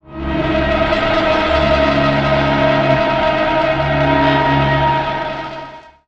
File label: Tonal Modular Drone